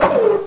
AARGH

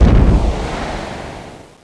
fire circle

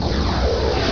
SHOT

